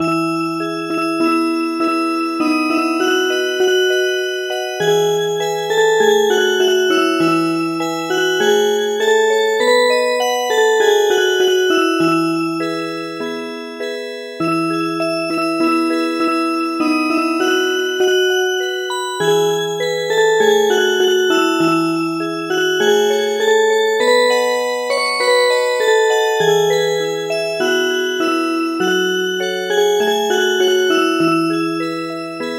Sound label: Music Box; Happy New Year Greece